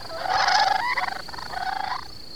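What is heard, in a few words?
sandhill crane, birds on the Costa Maya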